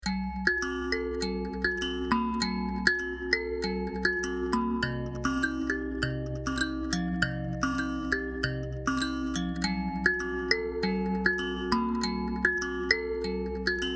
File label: mbira sample